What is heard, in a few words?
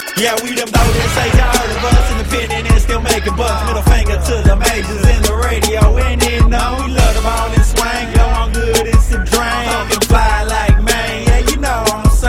Mic Pass